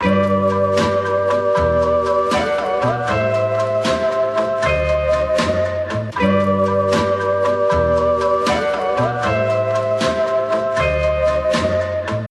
freak loop 78bpm; loops

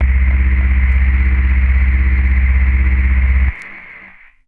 Polysix Organ Noise Verb Vinyl Synths C0; vinyl keys

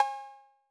Cowbell B 808, Clean Digital

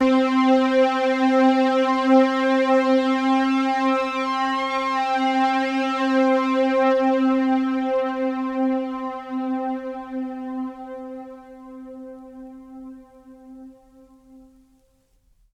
Polysix Strings Vinyl Synths C3; vinyl keys

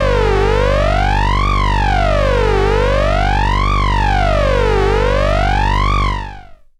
Sub37 Big Sync Vinyl Synths G0; vinyl leads

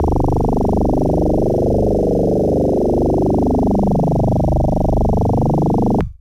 Electric Bass DX100 G0

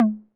Tom Hi 808 Saturated B

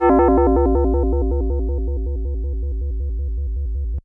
FM Bell FX DX100 B3

